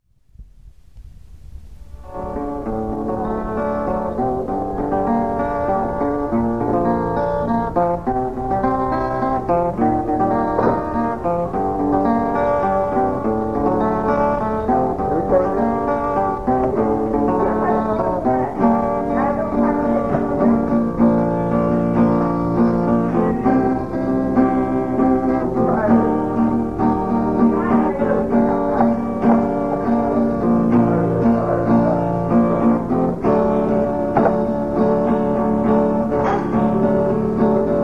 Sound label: Guitar; vietnam